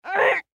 Human Grunt; Grunt Male Seven Yrs Boy; Grunts; Sound Effects:Voice

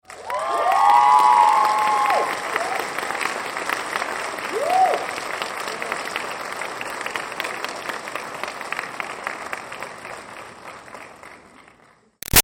Crowd Applause Cheers Audience Grad Med; Crowd , Applause , Cheers , Auditorium , Graduation , Med; Human

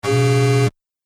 Gameshow Wrong Answer; Miscellaneous Electronic; Miscellaneous Electronic Sound Effects

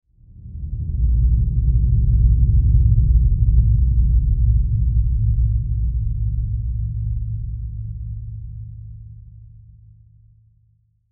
Sci Fi Spaceship; Pass By Lfe Rumble; Spacecraft

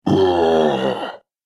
Giant Ogre Scary, monster; horror

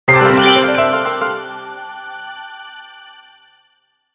Logo Piano Descend Swells Delay; Sonic Logo; Blastwave

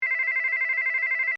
Phone Cell Ring Generic; Cell Phones; Electronics; Big Room Sound

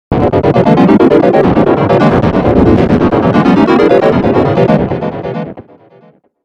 Analog Synth Arcade Warble; 5.1 STEREO MIX; Blastwave

